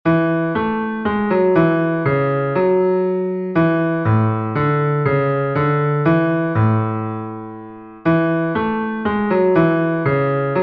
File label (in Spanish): bajo, himnos en piano; cantos del camino